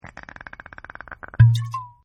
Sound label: Wine Bottle Pop, Wine Bottle Popping Open; Cork Sound Effects